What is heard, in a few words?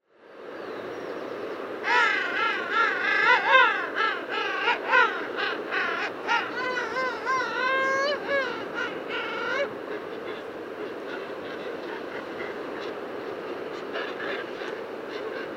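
penguin voice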